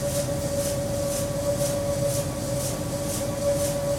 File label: drone operations, ambiances; CosmicRage; CosmicRageSounds